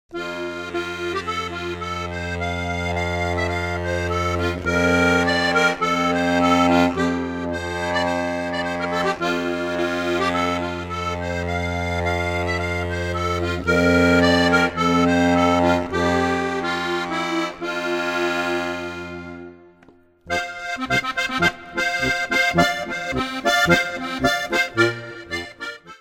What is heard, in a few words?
highland polka